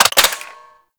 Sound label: Grineer Hand Shotgun Mech; Weapons